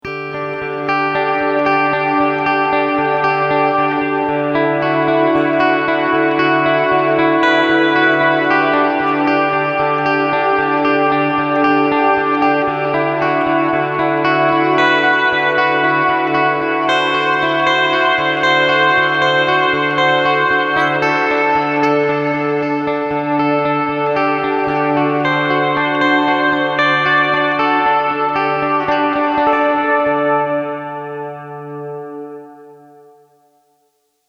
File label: Modverb